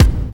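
silky kick single shot A key, kick tuned to the A note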